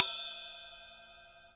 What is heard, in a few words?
crystal ride